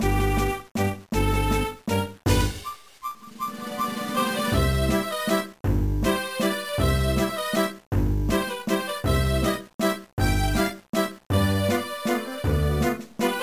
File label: Wizard Waltz; Rhythm Tengoku; GBA